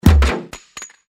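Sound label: a person was hit by the mirror, it fell out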